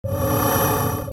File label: BELLS